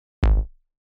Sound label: Progressive Psy Bass